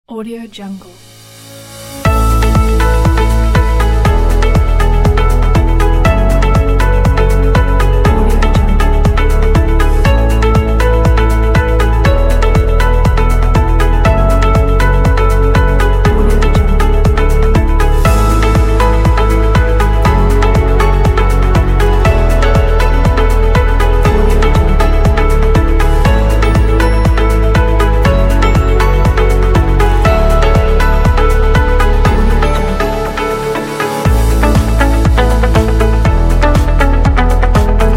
corporate pack

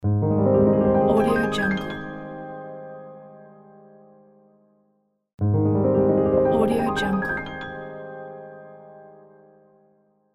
musical piano gliss

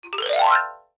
xylophone gliss up; sound ideas

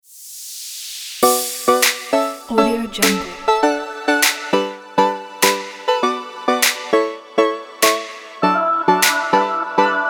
future pop

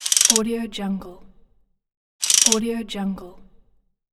rattle

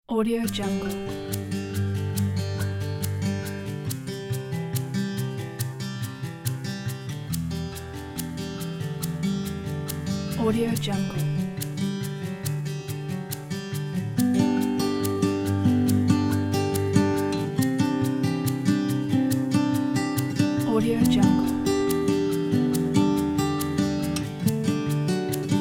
gentle acoustic folk

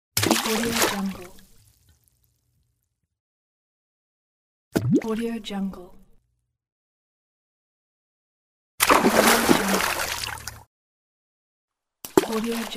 stone dropping in water

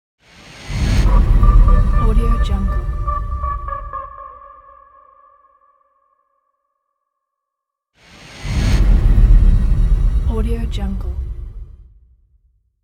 soft swoosh; anderington

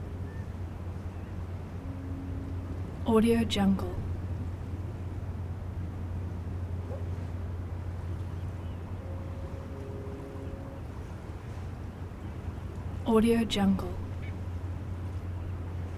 harbor day presence

